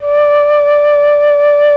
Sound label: FLUT; ILIO; Propeller Island Legendary M400